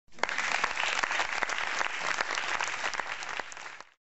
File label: win applause